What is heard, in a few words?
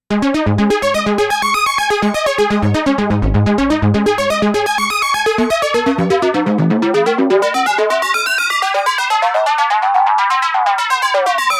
Korg Arpeggio Synth; Shift